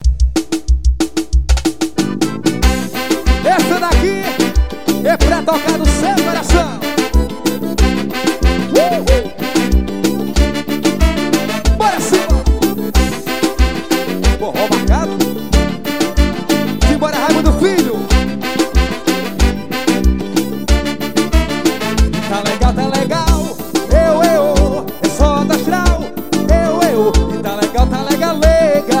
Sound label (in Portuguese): forro marcado